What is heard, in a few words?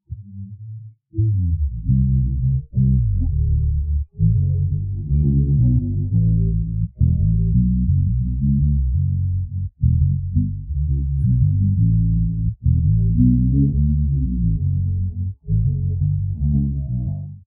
bass; spleeter